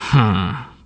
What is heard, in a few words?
"HMM"